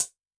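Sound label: Lite Close, HiHat; Yamaha DTXpress-II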